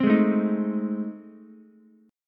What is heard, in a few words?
A Minor Major 7th Flat 5th A, Ukulele; strummed; AmM7b5; JGuitar